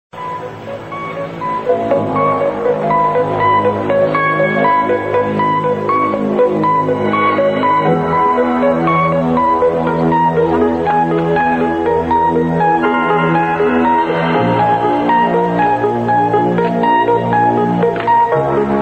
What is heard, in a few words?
dramatic theme